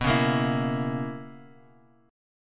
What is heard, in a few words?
B Major 7th Suspended 2nd Suspended 4th B down Guitar Open G